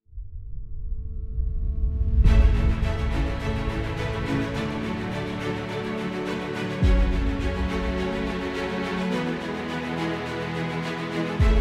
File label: Inspiring